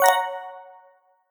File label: alert happy